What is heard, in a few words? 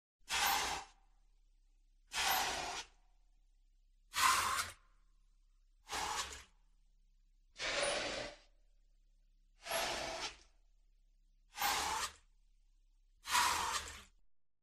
DOLPHIN BLOWHOLES 8 VARIOUS LENGTHS PITCHES; wildlife sounds